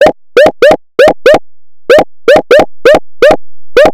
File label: drive sounds